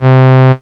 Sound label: Yamaha PSS-170 Cello C3